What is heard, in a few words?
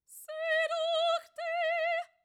choir